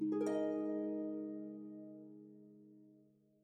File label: Calm Harp; Super Mario Maker 2